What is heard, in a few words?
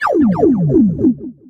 Character Attack